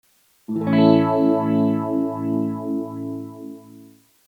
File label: strum